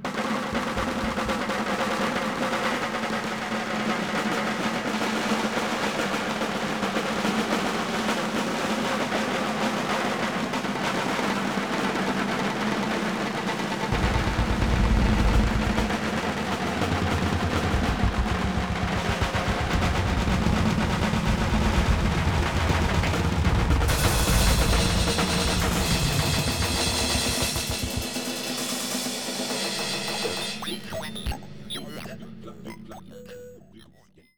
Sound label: Drum Finale